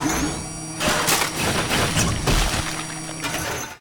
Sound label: crunch, trash collection